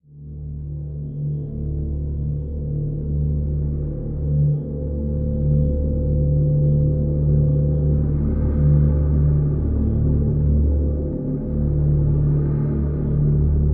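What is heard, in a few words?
Drone Caverns Deep D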